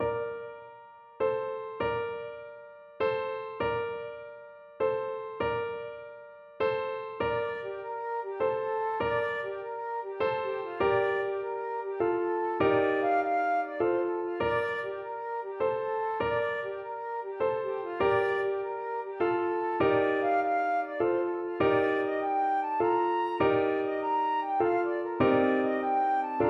flute

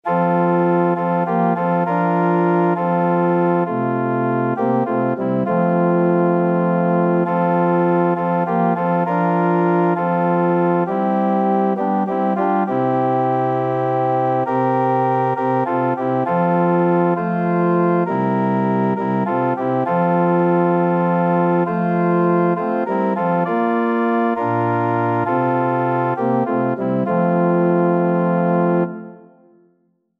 organ

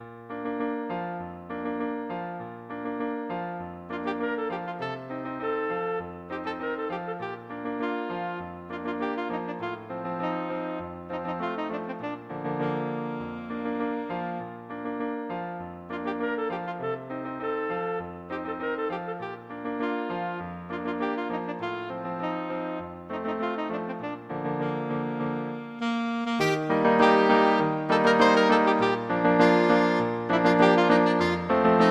saxophone

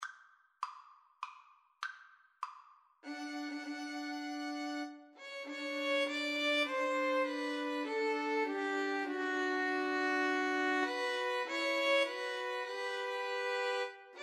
nocturne VLNs

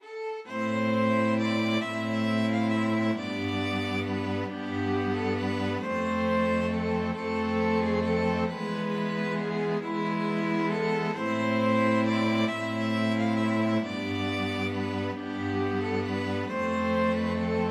greensleeves, string quartet

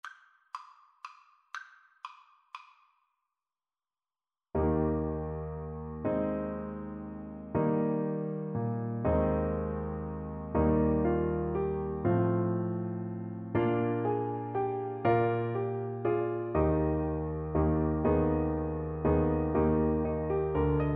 vieni sul mar, french horn

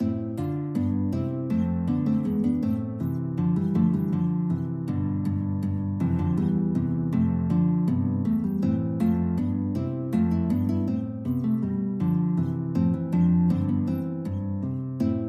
Bouree, guitar; Music for Fireworks

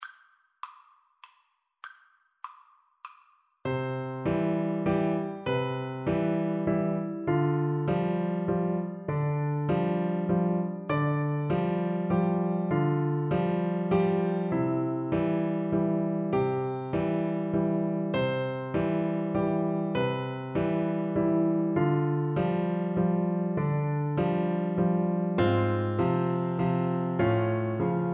flower waltz, Flute

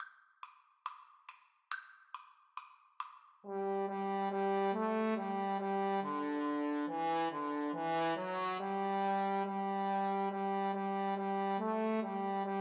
Trombone Duet

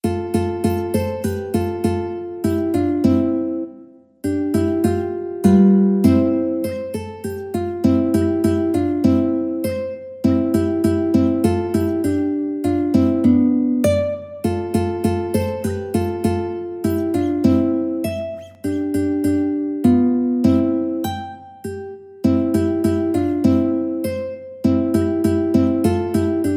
Suseri, mandolin

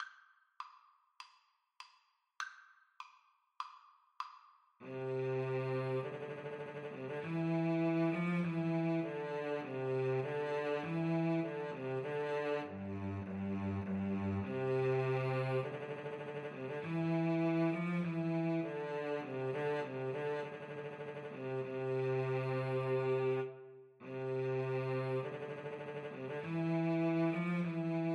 Cello Duet

